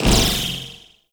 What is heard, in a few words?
Ricochet, Shotgun; Crp BE Arca Plasmor